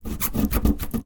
wood scratch scrape remove mark; foley

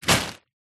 food frozen peas bag down on surface; zapsplat

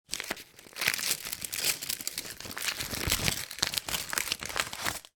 foley envelope letter open; sound effects one

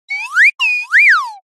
cartoon slide whistle wolf whistle

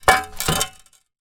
shovel movement gentle concrete; industrial

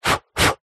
human blow short sharp blow chalk off cue

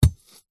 warfare knife tip impact hit dig into wood

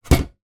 aquarium fish tank lid down hinged

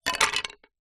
foley wood pieces thin small light drop; zapsplat

